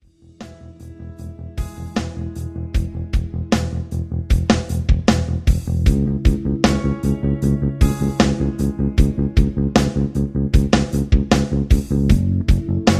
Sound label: rhythm